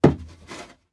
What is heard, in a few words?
Hand Grab And Slide Wood Doorframe; Foley